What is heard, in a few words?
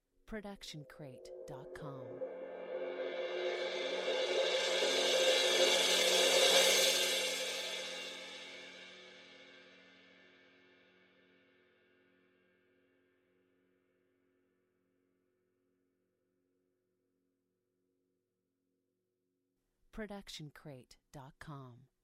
ride cymbal 20 inch swell mallets long; soundscrate